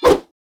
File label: Sword Swing